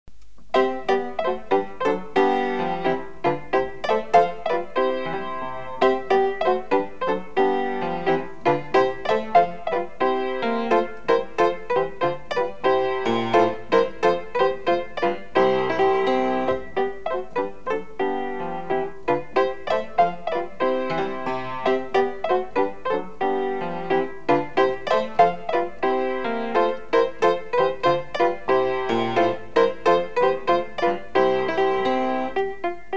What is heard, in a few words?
gigue